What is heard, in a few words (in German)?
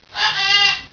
UHU HUHN